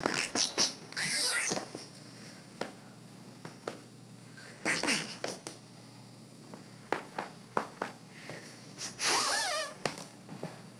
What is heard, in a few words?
reverberant rubber sneaker squeaks and scuffs